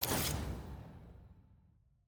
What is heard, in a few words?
perks drawer open; collections